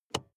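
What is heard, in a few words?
sports car interior handbrake release short; verkehr-transport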